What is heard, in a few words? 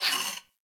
Moa Leg Movement; Enemies; Warframe